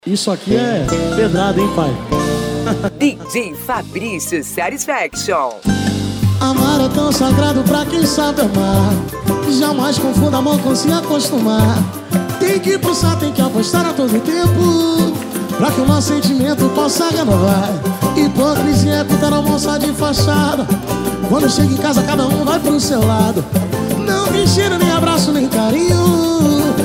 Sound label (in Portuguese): pagode e sertanejo; xtreme sound